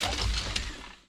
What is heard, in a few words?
Intro Pod Open Base Singles; viewfinder